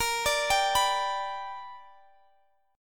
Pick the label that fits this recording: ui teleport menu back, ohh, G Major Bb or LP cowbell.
G Major Bb